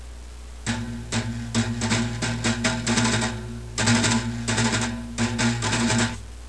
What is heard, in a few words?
drum